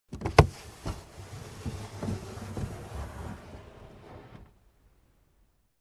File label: Entering Car